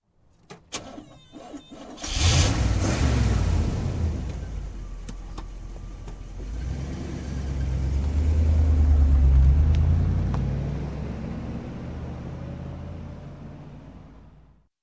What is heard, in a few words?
Muscle Car engine start and driving away